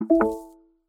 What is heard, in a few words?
Nokia wireless ringtone, Nokia SMS ringtones